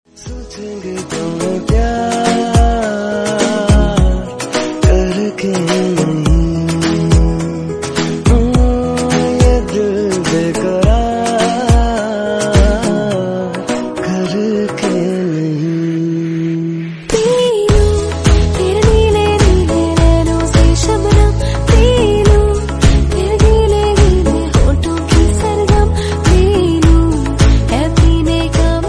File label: bollywood songs mashup tone ringtone